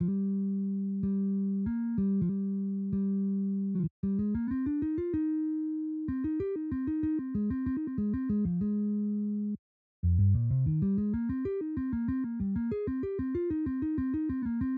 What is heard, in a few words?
Bass Solo